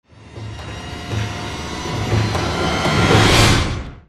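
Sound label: sting, strings smooth fade in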